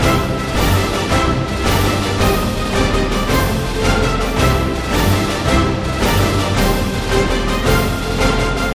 big Win Loop; excalibur

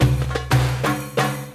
spingle Drum Loop; wild bazaar

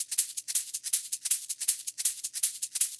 big win shaker; serengetikings

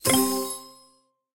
lion collected; serengeti kings

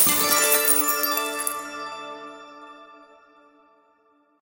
bonus chest coins; bloodsuckers2

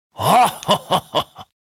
panda Fist Pump; shangrila

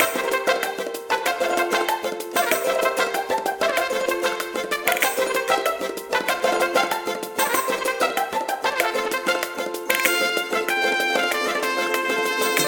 ambience Music Layer Tri Up; willyshotchillies

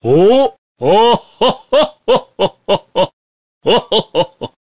Big Win Start; jingle spin; santa

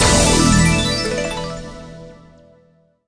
medium Win; twin spin

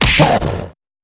grunt hit light; wild worlds

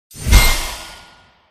chest Wild Landed; lost relics

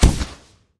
substitution Symbol Burst; shangrila

